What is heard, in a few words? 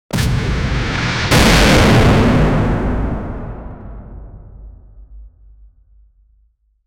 a deep boom from an, battle